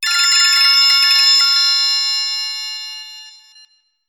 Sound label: loud phone ringing tone